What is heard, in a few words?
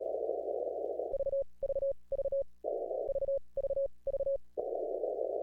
1500 Watts over the air on 40M no strong signals; Elecraft K4D; K4D FT3 CWT Audio